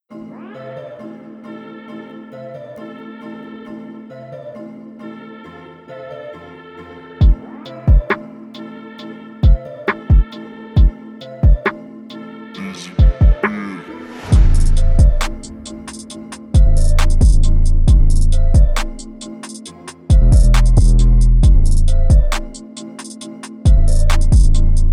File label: BEAT